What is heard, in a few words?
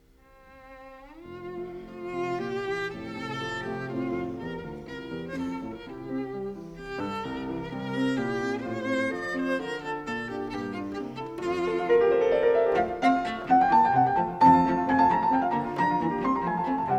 in F Major, Cello